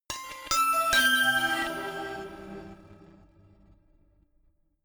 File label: notification glockenspiel crazy triad up major